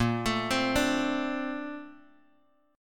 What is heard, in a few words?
A sharp Major 9th, Bass Standard; arpeggiated